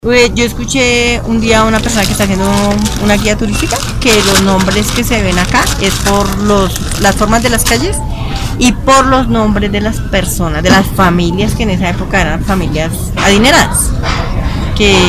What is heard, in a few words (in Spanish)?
calles